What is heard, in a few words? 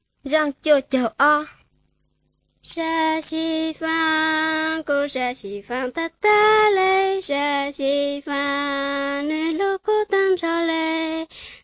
songs hmong blue